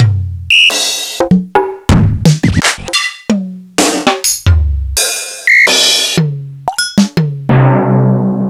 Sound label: Yamaha Tg330